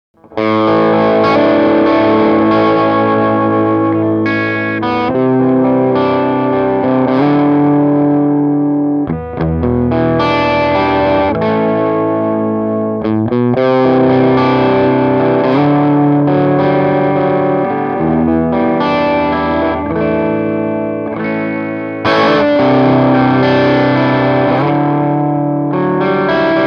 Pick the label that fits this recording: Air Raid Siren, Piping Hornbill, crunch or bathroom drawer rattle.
crunch